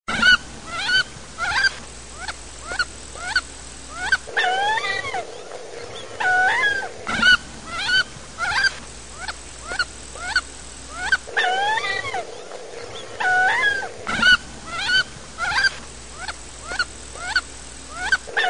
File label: Wood Duck Call